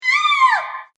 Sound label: British Female Scream; Screaming Sound Effects; horror sound effect